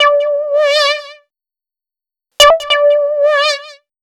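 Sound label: SYNT 2, 100 bpm, Funk; USB Soundscan vol.41; AKAI; Roots Funk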